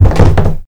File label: CREAK Wood Hollow Deep; mono; Don't Be Afraid The First Toy